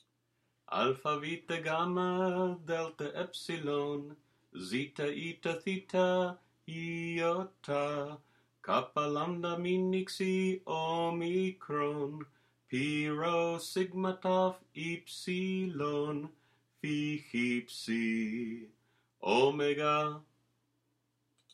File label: Alphabet Song